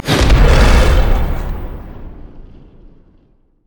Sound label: battle roar; rengar